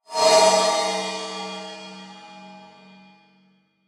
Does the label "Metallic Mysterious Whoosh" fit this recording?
Yes